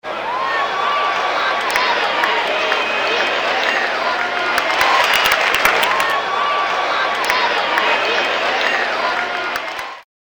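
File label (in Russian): шум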